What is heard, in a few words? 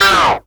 Synth Voc; Vengeance Samples; VEE Synth Shots